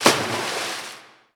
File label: Dolphin splash; RA3